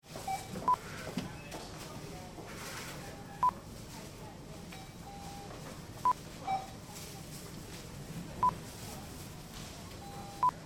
Supermarket Checkout; Waitrose

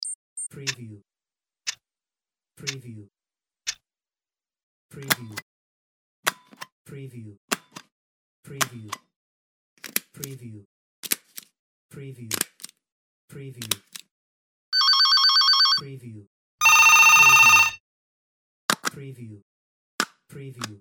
office sounds pack

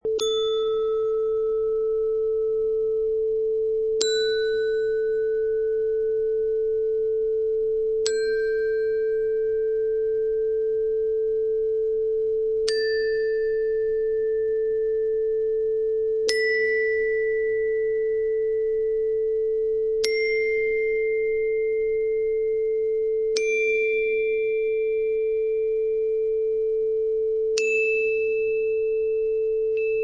Xylophone 8 Notes

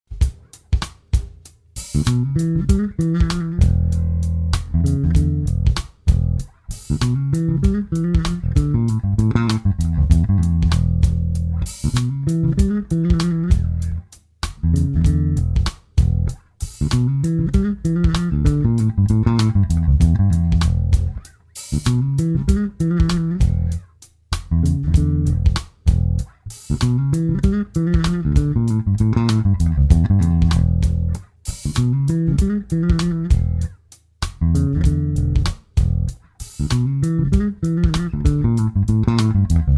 Blues style lick fill E7

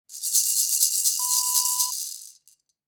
baby rattle

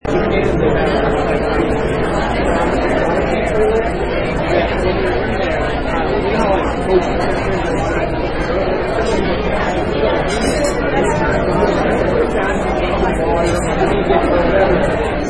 Restaurant Ambience

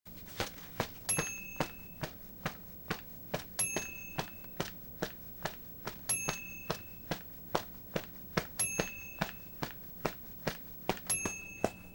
wings flapping

